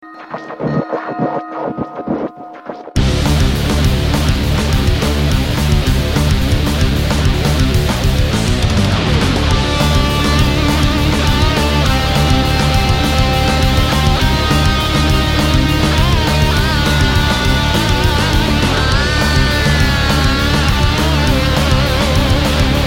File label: Rock